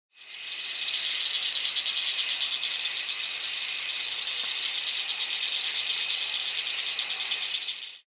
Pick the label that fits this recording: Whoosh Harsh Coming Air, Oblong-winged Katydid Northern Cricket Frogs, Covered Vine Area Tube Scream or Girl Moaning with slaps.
Oblong-winged Katydid Northern Cricket Frogs